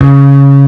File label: bass; osu